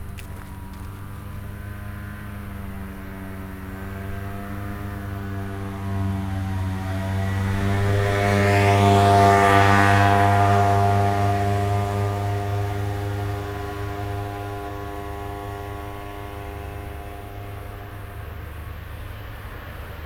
felfelé két jármű lefelé egy autó, kanyar; szallastol keletre; thassos 2016

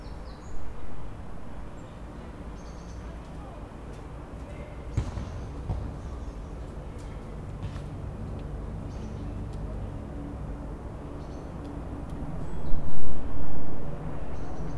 tavolban elrepulo focizo gyerekek, helikopter; angyalfold

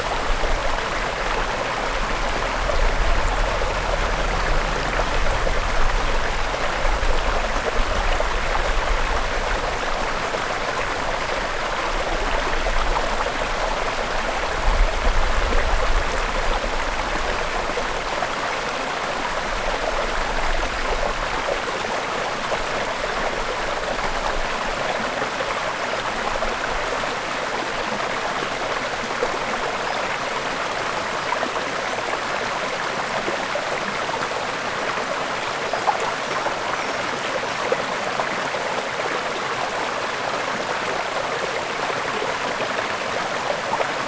szép hangú, patak; visegrad

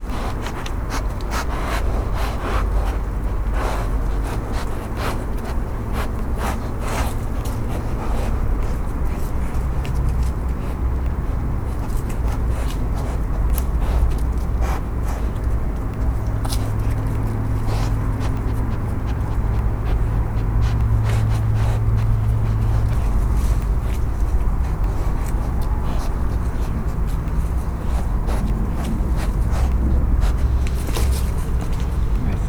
intenzíven szimatolnak, foltos hiéna

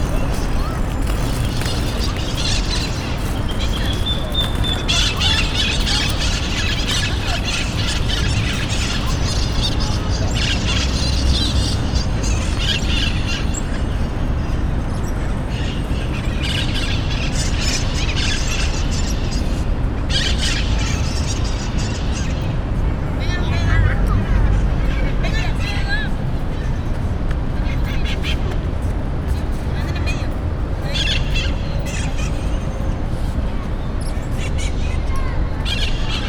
parkban hullamos papagajok

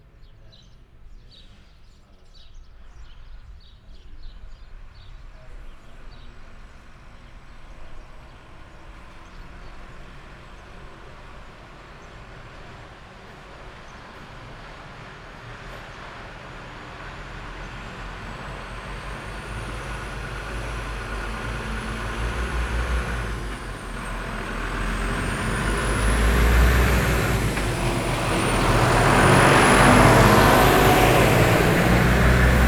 balakkal dugig megrakva, auto teherauto; felsotelekes

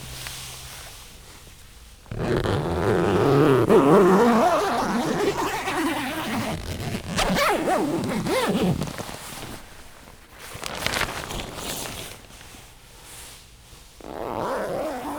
dupla ajtaju sator masodikra vizhaz, cipzar; cardioid

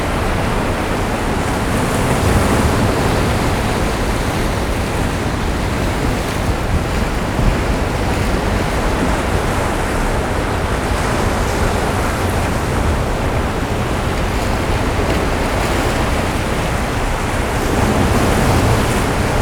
úttest mellől a kő gát tetejéről, óceán; orzola

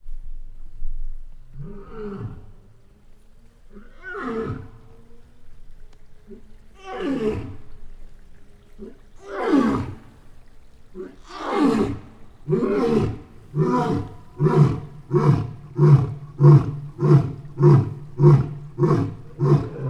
oroszlánok bömbölése patak; jaszberenyi zoo 2016

